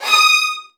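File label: violin; Virtuoso Strings; D#3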